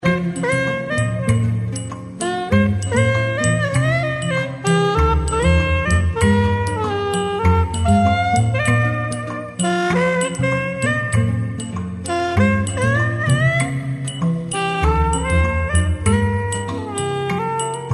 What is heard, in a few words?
woodsax